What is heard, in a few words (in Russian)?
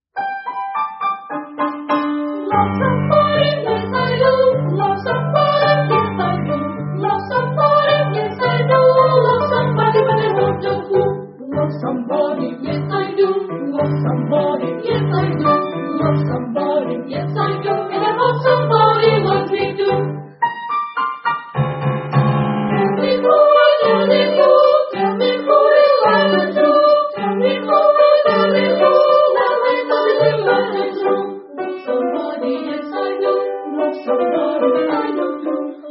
Хоровая капелла мальчиков; Folk Song "Love somebody"